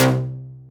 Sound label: Synth, DnB One Shots